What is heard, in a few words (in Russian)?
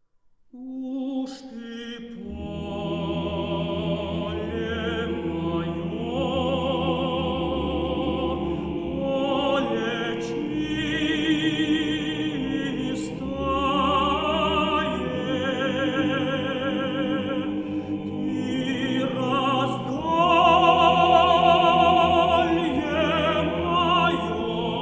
Хор